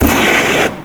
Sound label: flamethrower airblast; chicken fortress 2